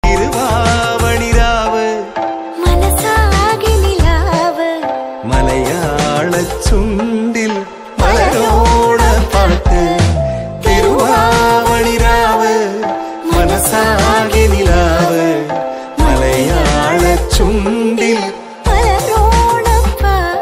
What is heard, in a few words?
Onam lyrical song; onam special